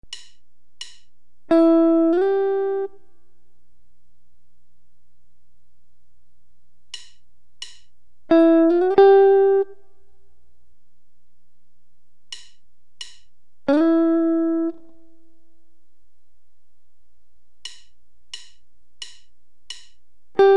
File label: Various slides, guitar